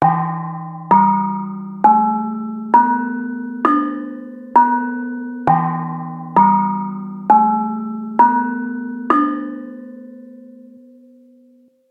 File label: gong melodie